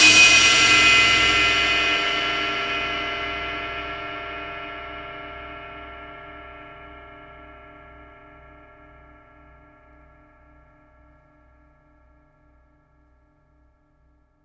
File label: Cymbal Hit, Impact Drum Hits; Sonic Foundry (Sony Creative Software); The Electronic Music Manuscript Collection